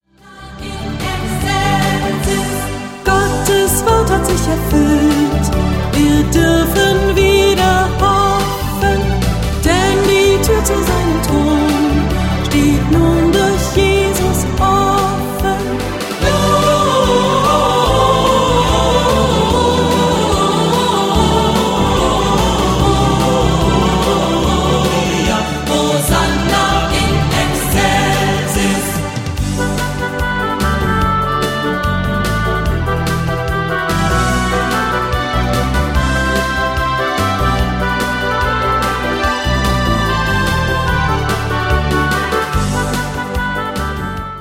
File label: hosanna in excelsis